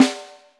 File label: Snares, Real